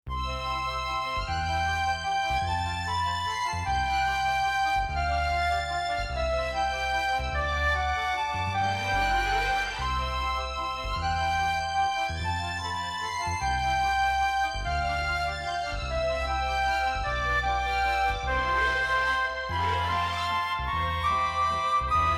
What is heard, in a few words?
cartoon romantic waltz